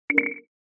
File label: Warning; Audio themes